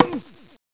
TENNIS

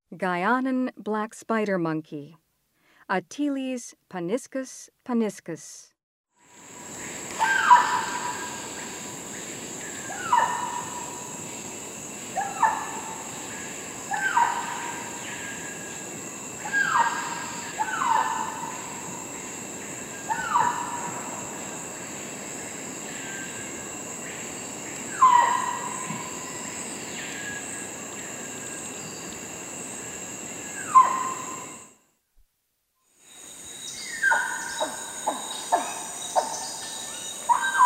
Guianan black spider monkey; S. America; Rainforest

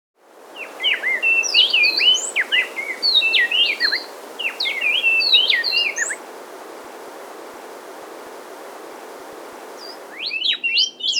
Inca Wren (Thryothorus Eisenmanni), Andean Birds; S. America

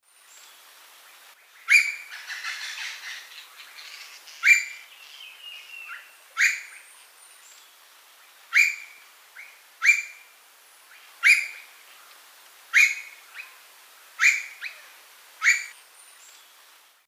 Psarocolius angustifrons, calls; S. America; Eastern Lowlands

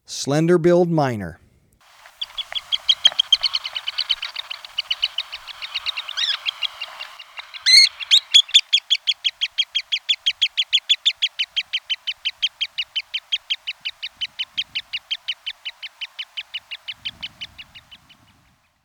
Slender-Billed Miner; S. America

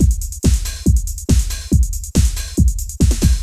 techno 140

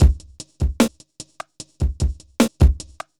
hiphop

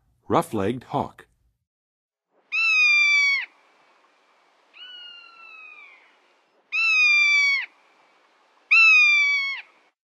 Rough-legged Hawk, Birds of North America; Western USA; Western USA Songbirds

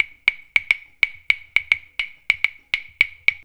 Perc, Percussion Latin

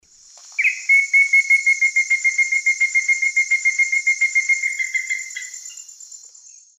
Formicarius analis, call and song; S. America; Eastern Lowlands

